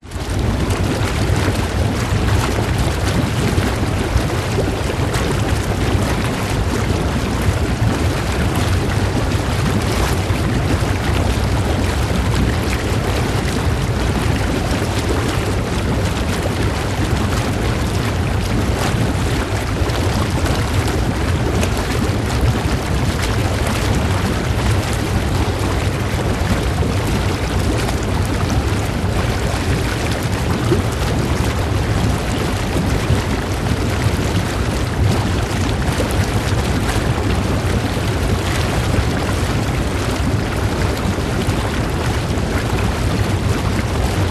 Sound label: spa jacuzzi water bubbles run long; xy; public places; zapsplat